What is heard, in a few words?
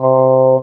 Talk Box "oh"